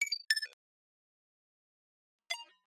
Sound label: robot friend beep; firo runner